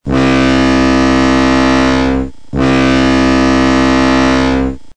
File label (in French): Sirène Bateau, Sonnerie Pour Telephone Portable